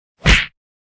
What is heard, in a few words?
punch face hit; fights bodyfalls; Asian Show Sound Effects